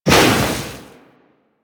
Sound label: Burst; Warframe; Purgatory Warrior